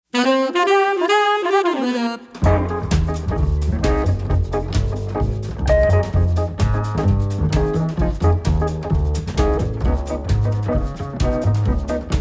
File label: Vroom Vroom; Champdolores